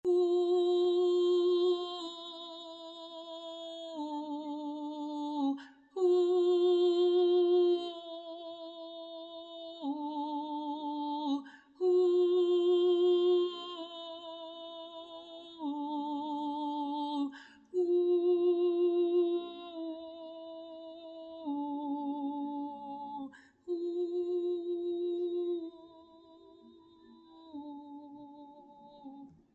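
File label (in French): partie 3 voix milieu, chanter entre femmes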